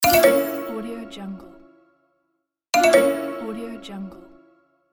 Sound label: mobile game wrong